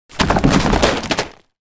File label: impacts food items fall kitchen shelf; zapsplat